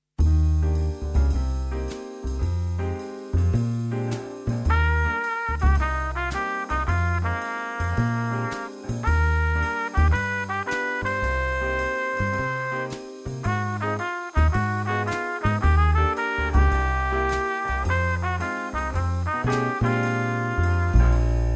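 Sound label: Smooth Groove Trumpet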